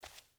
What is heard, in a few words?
footstep burlap concrete walk; Rust